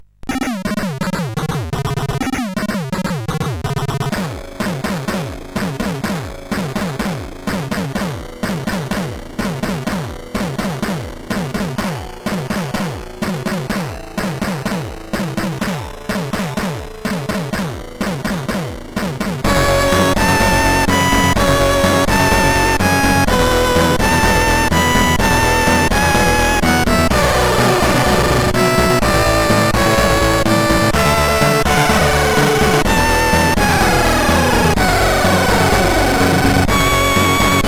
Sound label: Hard Rock n Riffs, CSG8580R5; Selles Ward